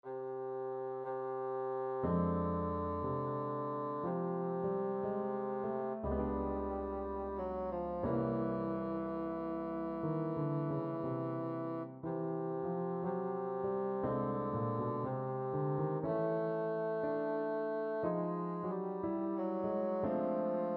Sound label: bassoon